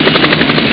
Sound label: copter; Amiga Soundtracker Sample Packs